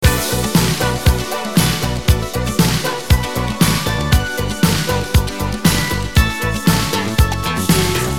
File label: disco